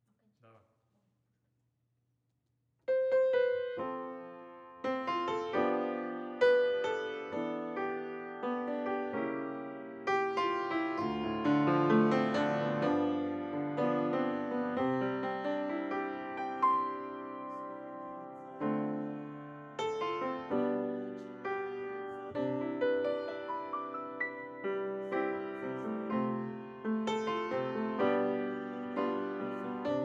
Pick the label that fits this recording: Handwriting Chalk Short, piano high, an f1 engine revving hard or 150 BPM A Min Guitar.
piano high